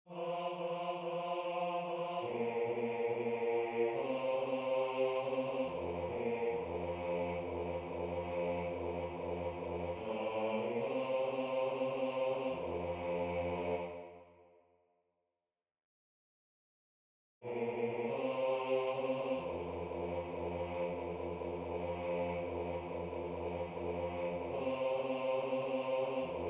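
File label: Bass, Religious Folk Songs Of the Negro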